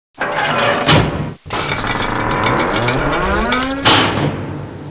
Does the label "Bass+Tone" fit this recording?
No